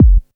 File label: bass drum deep, techno trance drums; Ueberschall; Techno Trance Essentials